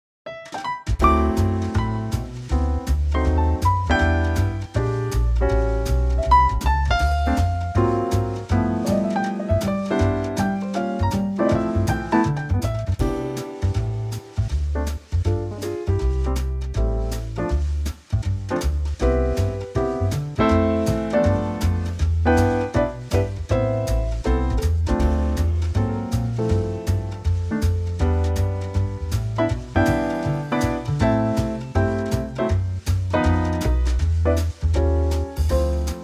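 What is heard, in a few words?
C Trio, Backing Tracks for Male Vocals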